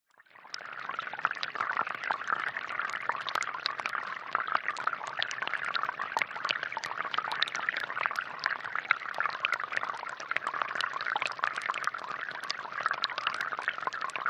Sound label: water flow small channel underwater hydrophone